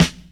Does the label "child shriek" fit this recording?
No